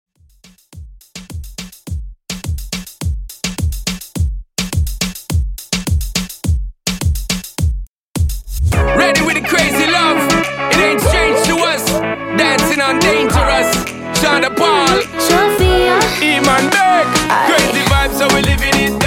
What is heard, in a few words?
Moombahton Mix 105Bpm